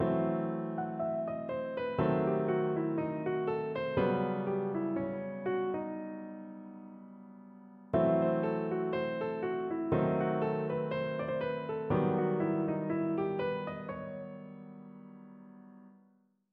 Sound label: licks, piano